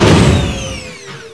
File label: door; aggress